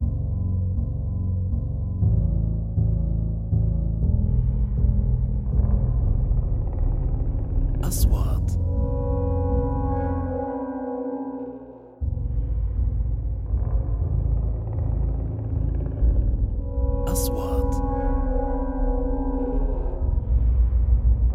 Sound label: music Horror